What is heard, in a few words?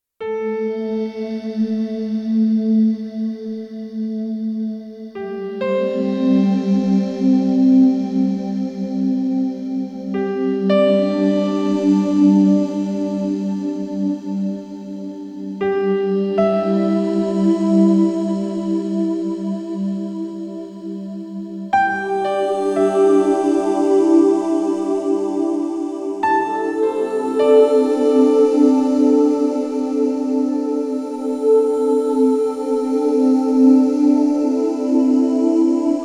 Yoga Relaxation Music; Reduce Stress